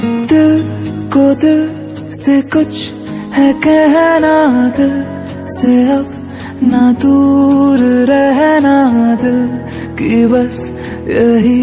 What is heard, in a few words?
New Hindi Song Ringtone